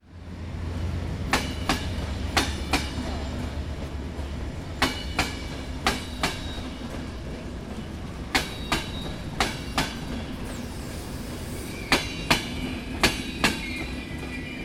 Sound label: train sound railroad and breaks; passing